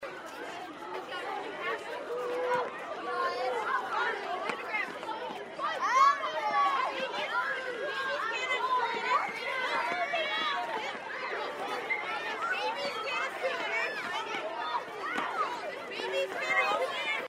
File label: Children at Play